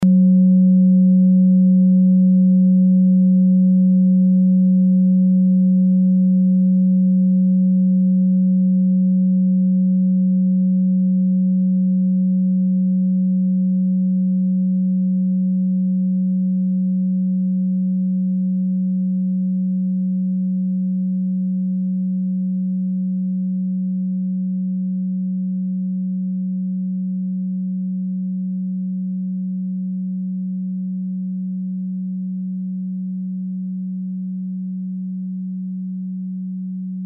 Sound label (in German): klangschale ladakh; Die Klangschale kommt aus einer Schmiede